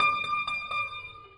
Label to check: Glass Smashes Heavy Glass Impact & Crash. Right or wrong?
Wrong